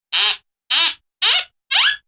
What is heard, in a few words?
cartoon squeek